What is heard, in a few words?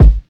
tight lows bass drum sound g sharp key